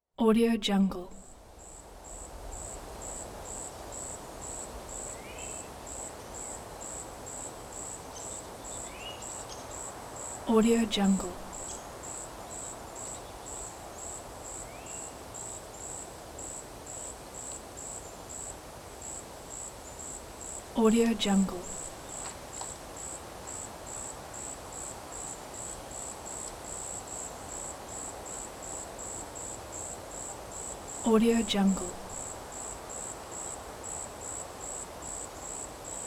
quiet night

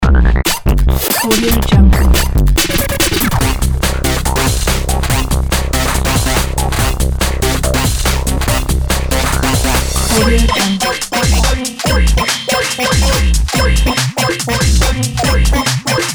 active zone; shewumusic

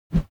whoosh bamboo; foley; smartsoundfx

